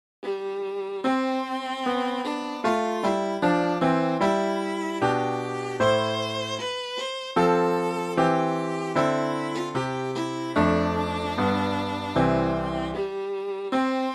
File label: viola